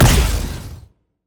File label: attack light; bloom minigame